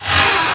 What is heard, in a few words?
DOOR CREAKS; SFX; AKAI S-Series CD-ROM Sound Library VOL-8